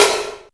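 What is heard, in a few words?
metal snare drum, FM drums; East West; Global Sounds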